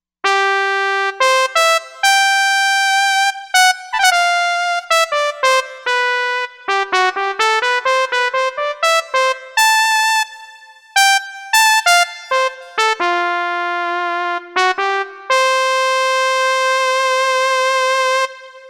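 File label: Brass Solo Trumpet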